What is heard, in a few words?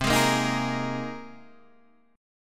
C Minor 7th Sharp 5th C Guitar DADGAD